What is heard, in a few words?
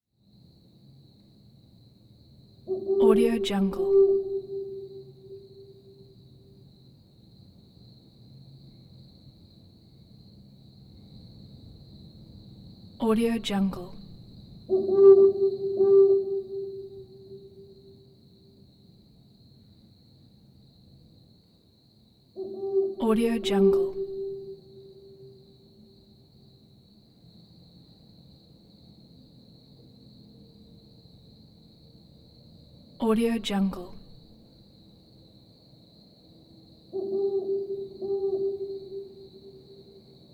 owl hoots echoey reverberant several versions; prosoundfx